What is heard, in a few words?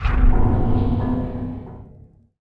banish caster; warcraft3